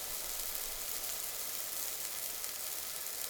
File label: Smoke Sizzle; Liquids; openclonk